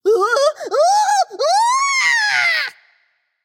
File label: fear light; smite; voicepack; hel